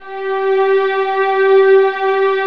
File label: VIOLINS, Synclavier Strings; ILIO